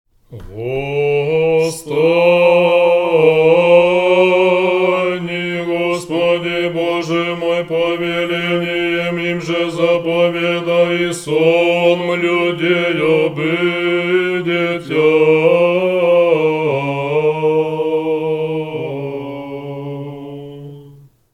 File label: prokimen tone 3